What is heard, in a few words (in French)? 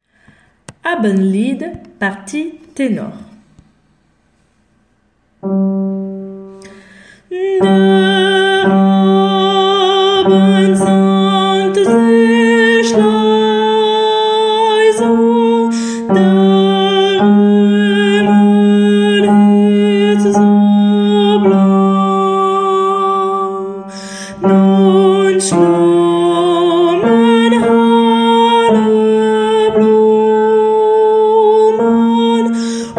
Ténor; Abendlied